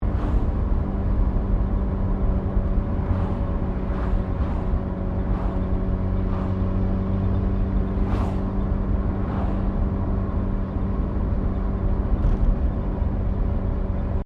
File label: CAR MAZDA RX7 SERIES3 INTERIOR CRUISING 60KPH PASSING TRAFFIC; 1983; Cars; Vehicles; SoundEffects-Foundation1